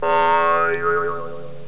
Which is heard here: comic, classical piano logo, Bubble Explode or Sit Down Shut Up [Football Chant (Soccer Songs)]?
comic